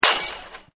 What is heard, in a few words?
Ball Hits Fence; Soccer; Sports